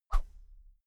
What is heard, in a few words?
whoosh thin slow hard; swooshes-swishes